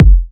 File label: perfect kick drum sample f sharp key; kick drum samples